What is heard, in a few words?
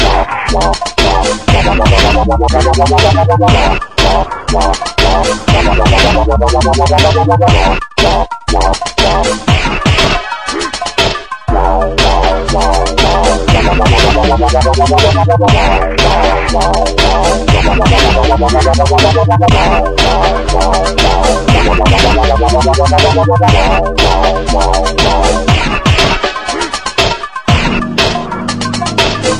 filter midi; MIDI Fighter